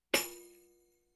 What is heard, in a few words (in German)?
Drums Percussion Tambourin Schlagen